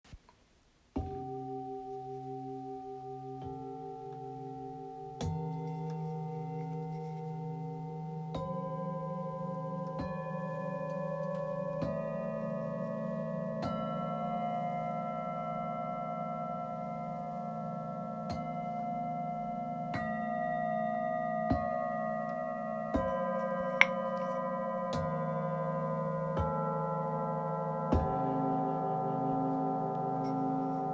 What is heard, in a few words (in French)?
Set bengale voyage sonore